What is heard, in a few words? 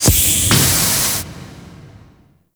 Organ Pipe Open; Ambience; Gameplay; Warframe; Orokin Moon